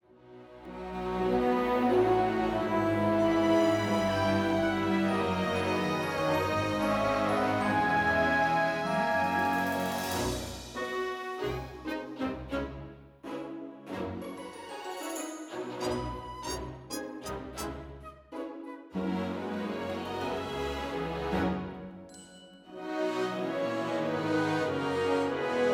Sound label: Instrumental; Rhythm Doctor OST